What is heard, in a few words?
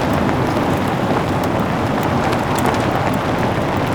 DPV running, vehicles; WarRock